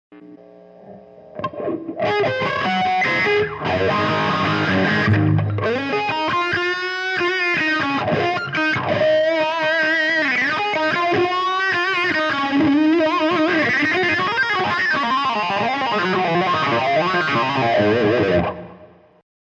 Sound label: satch wah